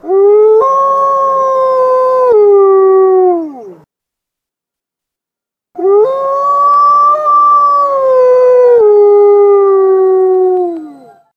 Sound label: wolfs howling; animals